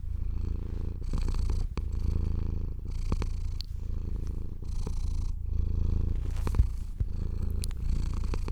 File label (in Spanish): ronroneo gato sonidos agradables